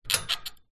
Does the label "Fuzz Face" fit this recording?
No